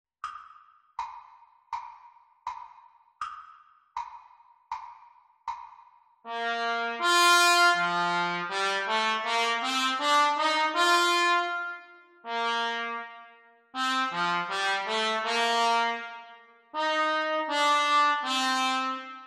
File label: Trumpet Duets Practice Speed; Grade 4